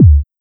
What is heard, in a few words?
Kick